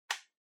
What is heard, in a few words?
Portable Radio Select Switch